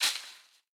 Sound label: Disc Rico Tree; Boomerang Fu